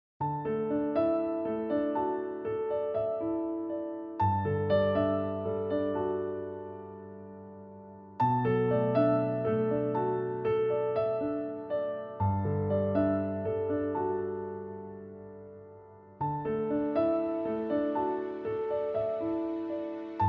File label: Light Piano